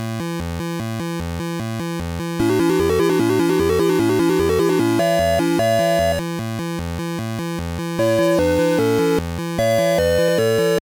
Walking; The Three Stooges; NES

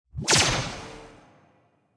Dark Army Blazing Mage Attack Loop start

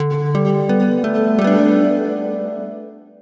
Lullaby

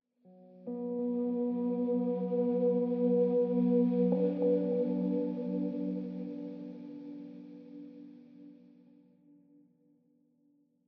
Tension Mood

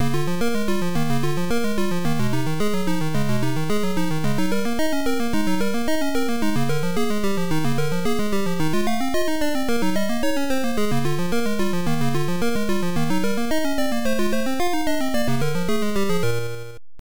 All Clear; Lode Runner II The Bungeling Strikes Back; arcade; Irem M62

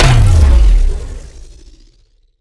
Sciweap Shot Large Blaster Gravity Disintegrator

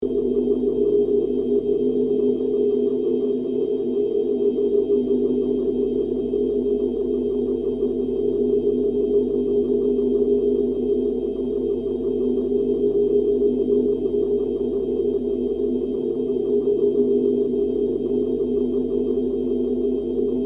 Ruins Light Beam Loop

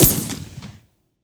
Shotgun Shot

